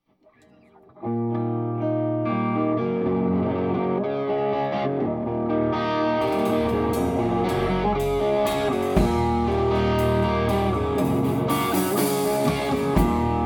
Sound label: Three chord noisy